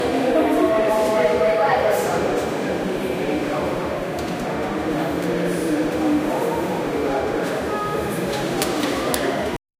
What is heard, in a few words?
hospital ambience in, dubai